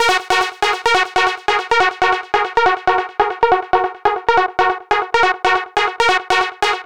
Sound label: NRG Sweep, Trance; Sonic Foundry (Sony Creative Software); Trance NRG VOL-1; Sweeps